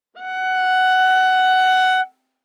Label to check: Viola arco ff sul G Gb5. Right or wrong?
Right